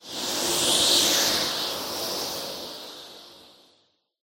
gust wind

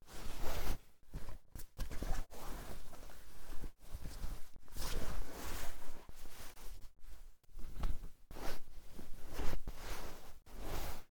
clothes change

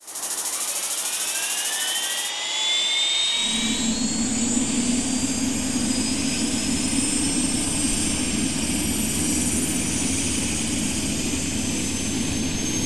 helicopter engine start

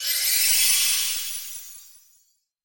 magic cure

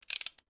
cutter

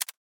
decision, button

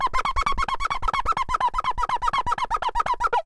wipe

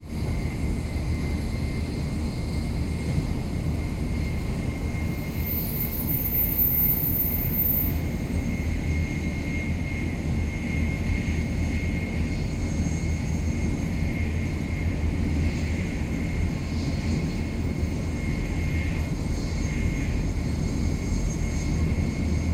bullet train driving

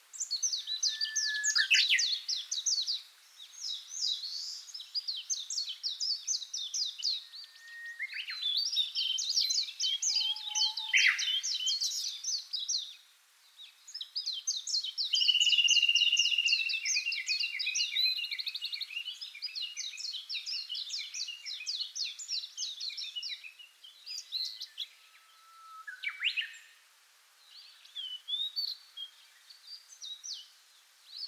spring mountain

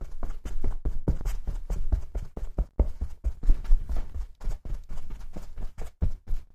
dash carpet

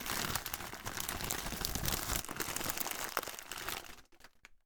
sweet bag put

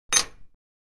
katana hold

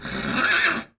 horse cry

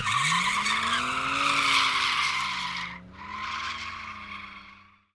car rocket start

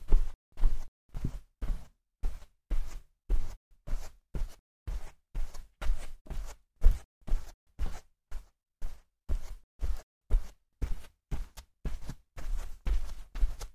walk carpet

